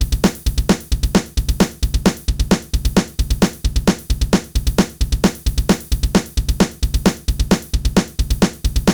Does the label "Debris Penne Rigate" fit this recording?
No